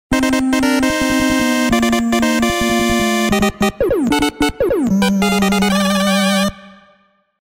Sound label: mmsoundtrack; Mega Man 2; gamesoundopedia